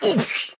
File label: Scream